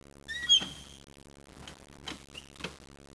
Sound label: bucket, fire; disaster